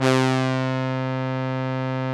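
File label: Juno brass, analog synths; 90s Sample CDs; Club-50 - Foundations Roland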